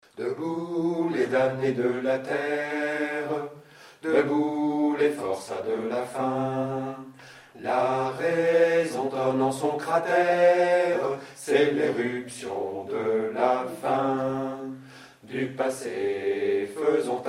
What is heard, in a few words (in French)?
basse; Internationale